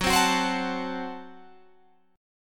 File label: Gb Diminished, Guitar Open G; chordshape; down